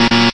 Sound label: error